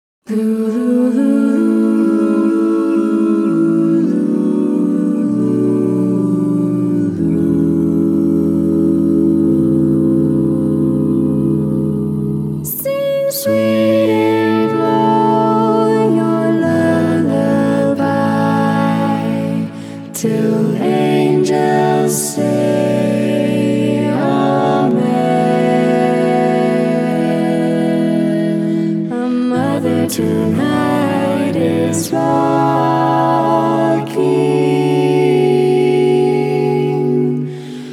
SATB acappella

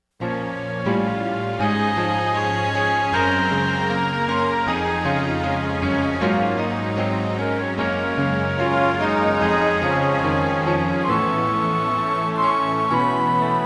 SATB

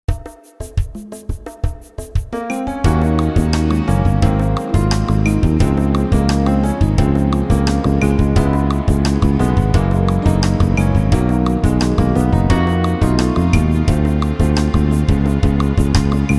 accomp track

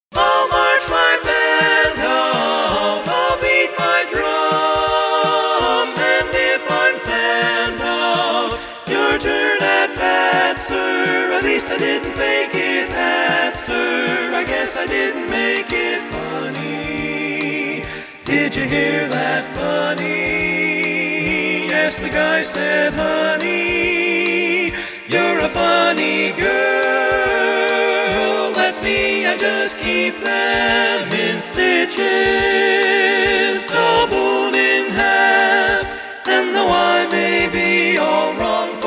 Medley; Funny Girl

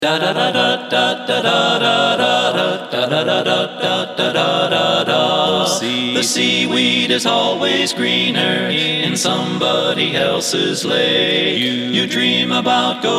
acappella

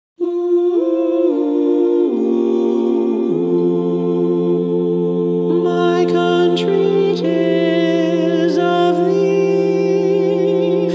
quartet version

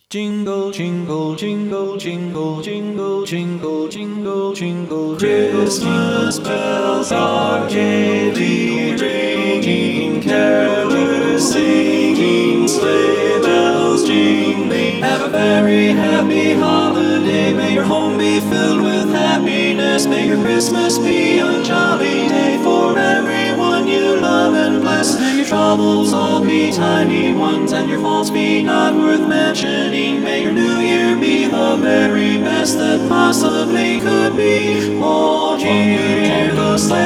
Holiday Blessing Jingle Bells; 4 and 8 part

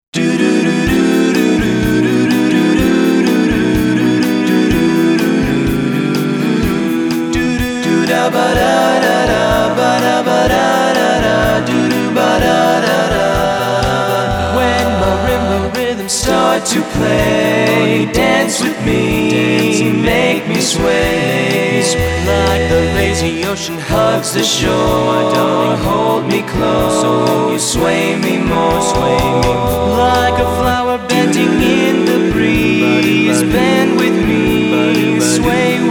long with perc

